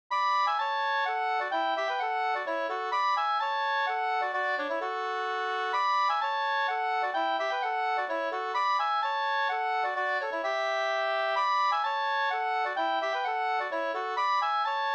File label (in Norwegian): pols; Sulhusgubbens drom no 2st